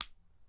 click

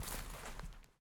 step bush

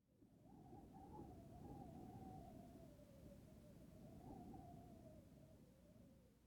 grass

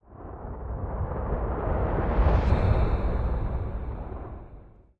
rewind end

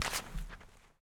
step bush

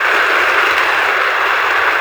slide

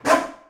revert

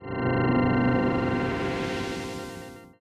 teleport

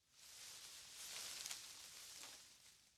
grass

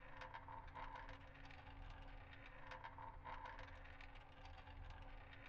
rolling

